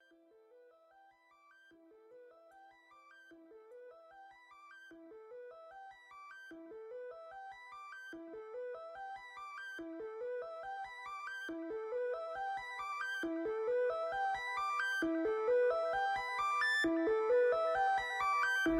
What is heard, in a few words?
moog